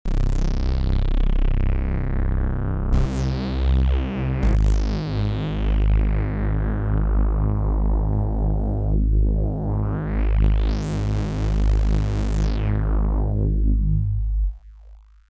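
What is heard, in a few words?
bassa, synth; Terratec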